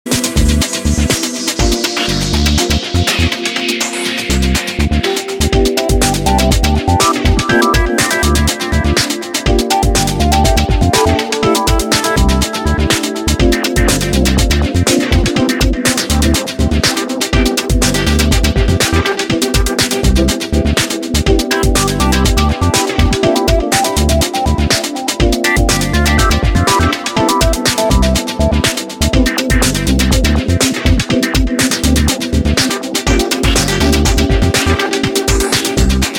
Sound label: fuzz jazz